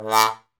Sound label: BONE, Trombone; Solo Brass